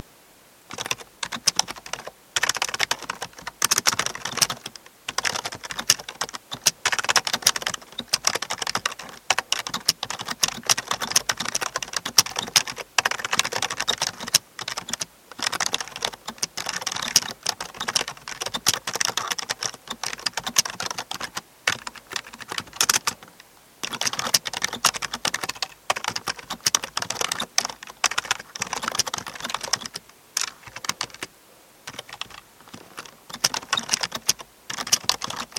i have a squeaky space bar, sounds of the home office